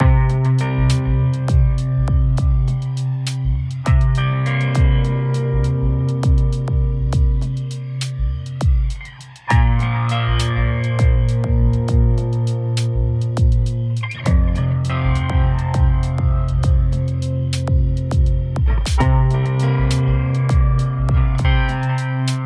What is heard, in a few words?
Same Riff without Delay and With Drums, guitar; garageband guitar